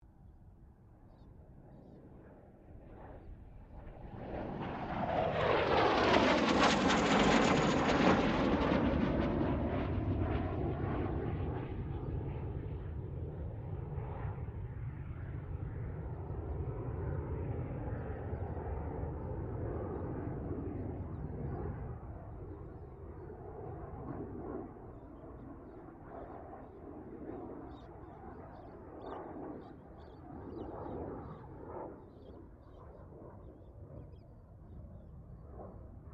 AIRCRAFT CA-27 SABRE PASS DISTANT; CA-27/F-86 Sabre; Airplanes; Vehicles

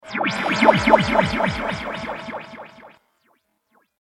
Laser Love, science fiction sound effect; Laser Sound Sound Effects; star wars / trek style games

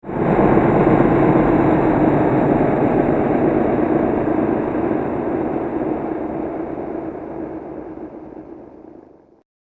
Scary The Falling Mist, scary soundscape; horror

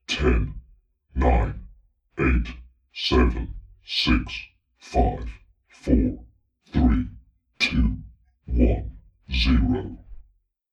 Halloween Monster Countdown

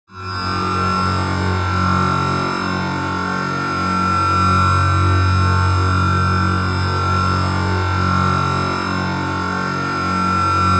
Drone Square Wave, Warble; Production, Element, Drone

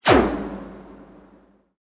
Sweep Mechanical Fast

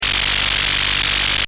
Noise, Digital; Sound Design

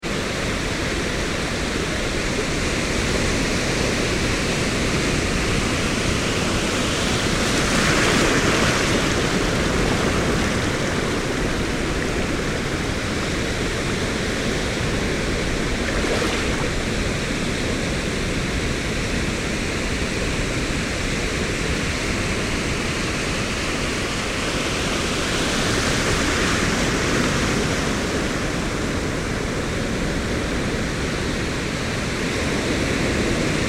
Waves Ocean Costa Rica Rolling; Nature

